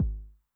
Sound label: BD Med Tune Long Decay, 909mod, BASS DRUM; classic machines